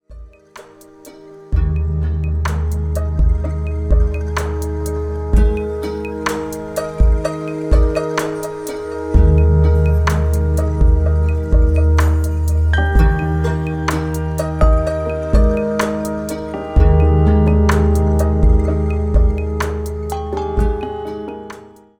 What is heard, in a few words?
music sample yoga